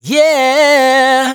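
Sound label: riff Yeah Flips C minor, Vocals, Dry Vocals; Raw Vocals; Slate Digital Omnivox Vocal Sample Pack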